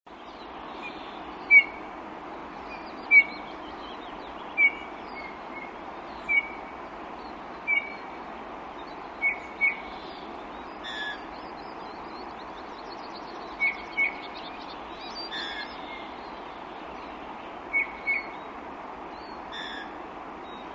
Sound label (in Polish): gil - nawoływanie i śpiew